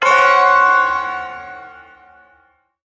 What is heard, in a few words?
Shawzin Hirajoshi Chord; Hirajoshi Chord L; Warframe; Duviri Ersatz Shawzin; Chords